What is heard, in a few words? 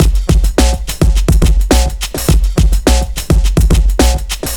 android funk, 105bpm; Zero-G; Freak Beats